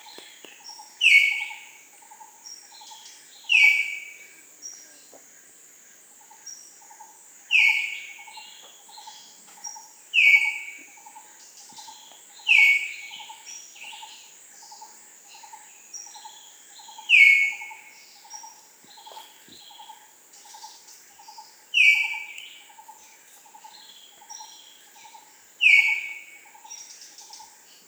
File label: Common Hill Myna Gracula religiosa; tropical birdsong